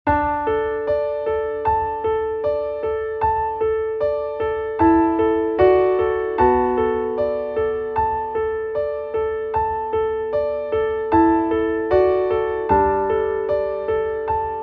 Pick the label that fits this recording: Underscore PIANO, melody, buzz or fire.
Underscore PIANO